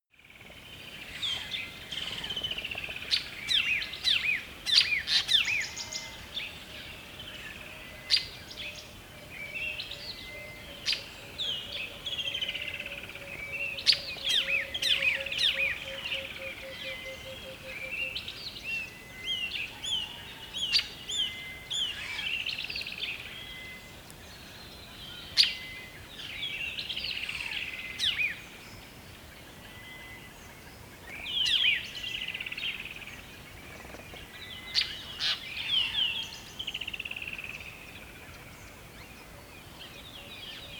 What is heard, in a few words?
river dawn birds